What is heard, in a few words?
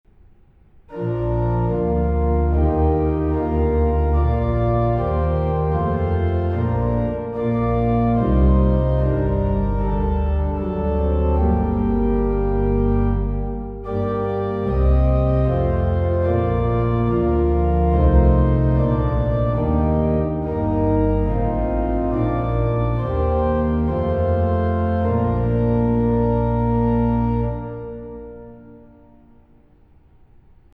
kilsyth, hymns organ